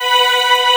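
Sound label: viola loop; 3D Multis; 3D Pads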